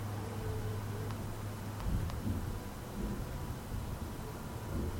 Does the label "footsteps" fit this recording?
Yes